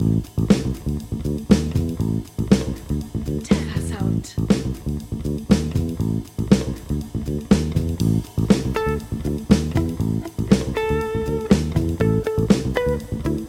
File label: jumping jazz; terrasound